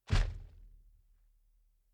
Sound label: dinosaur footsteps boomy thumps